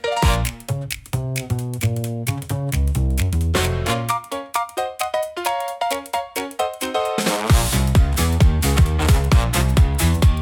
electro swing pop for trendy bouncing rhythm

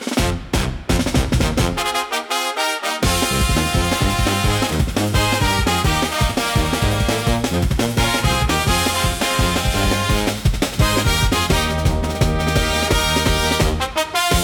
Second-Line Stampede, upbeat carnival; for festivals and parades

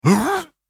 "Ugh", samurai voice; samurai voice selection 2

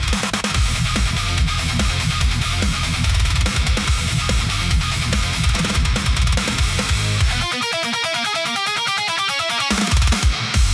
modern metal for dark aggressive

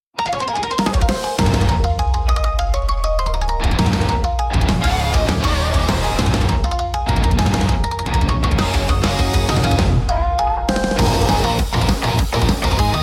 intense japanese rock

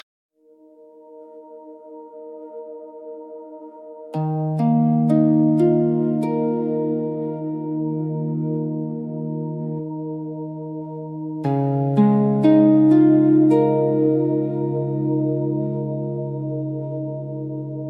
Stone Lantern Rain, quiet japanese ambient

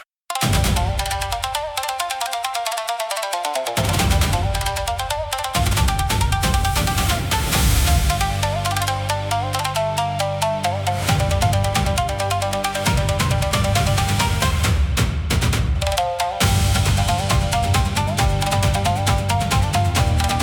Thunder At Dusk, cinematic japanese style battles

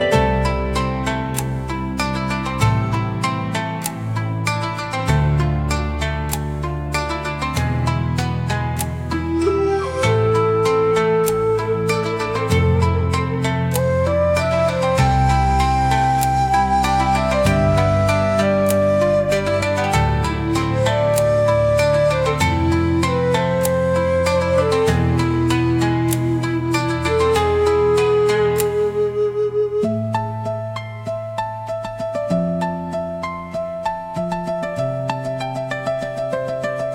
Crimson Temple Rain, emotional japanese ballad

